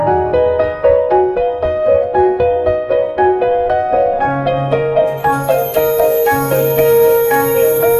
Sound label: romantic intro